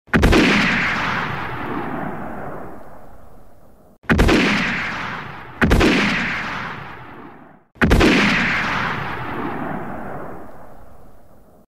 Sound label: Spaghetti Western Winchester Rifle sound